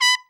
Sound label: TRUMP SRT, TRUMPET; Best Service; Killer Horns